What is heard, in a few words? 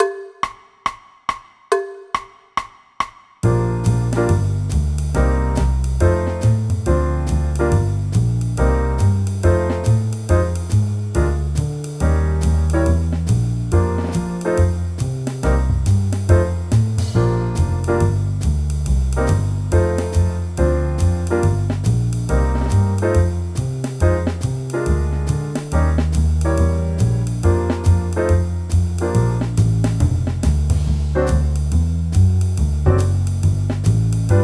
Alto Sax Bari Sax Playalong